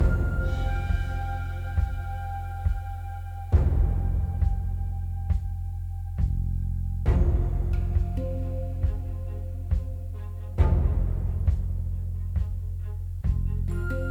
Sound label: Intro 136bpm; Biome 2 Sp